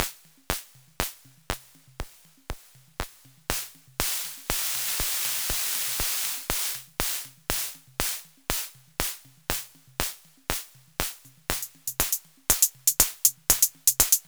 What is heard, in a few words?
clap